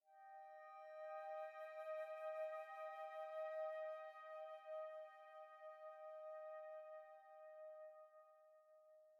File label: Env Field Night Ground